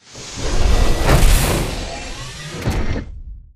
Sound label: melee stomp; Titanfall 2